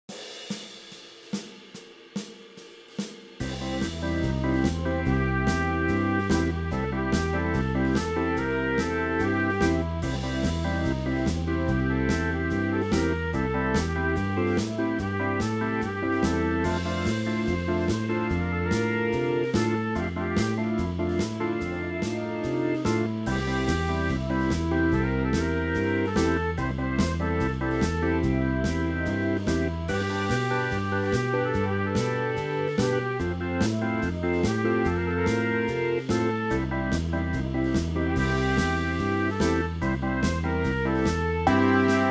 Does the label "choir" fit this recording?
No